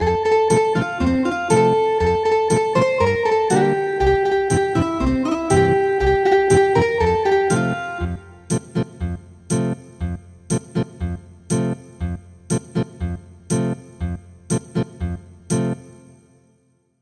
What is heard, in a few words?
Ethnic Guitar